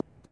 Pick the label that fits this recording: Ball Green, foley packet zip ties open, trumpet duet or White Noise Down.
Ball Green